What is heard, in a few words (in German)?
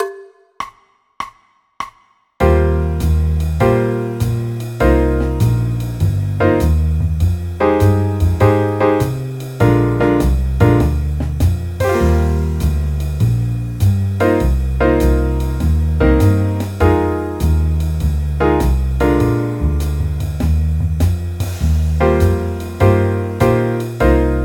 eb dur akkordverbindungen